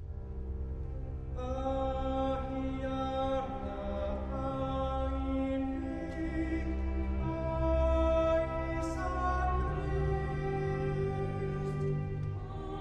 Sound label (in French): Irish Mass Gloria Solo ténor